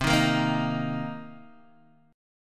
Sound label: C Minor 7th C Guitar Open D